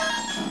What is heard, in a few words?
mew; Pokémon; Mew's Cry